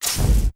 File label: fire in, flamethrower; halo; weapons